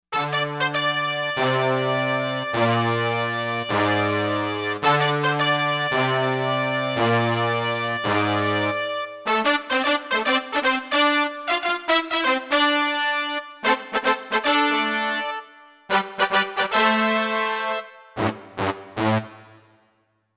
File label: Brass Earth Brass